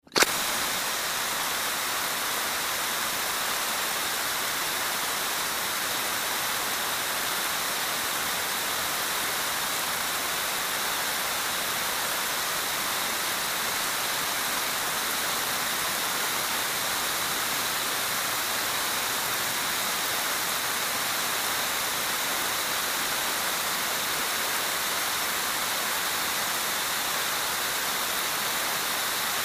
Sprinkler 2; Sprinkler Turned On And Off Twice; Constant Sprays And Trickles. Medium Perspective. Lawn, Garden, Water.